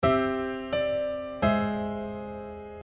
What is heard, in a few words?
diatonic